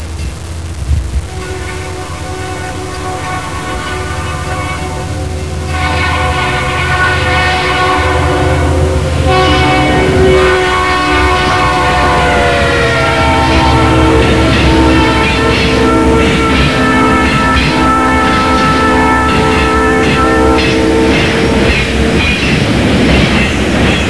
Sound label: Diesel; atsf